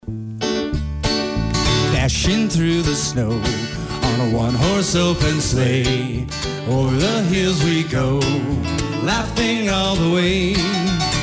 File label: jingle bells